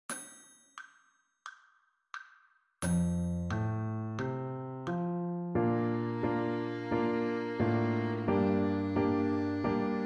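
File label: Bb major arpeggio 2 octave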